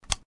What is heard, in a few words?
pick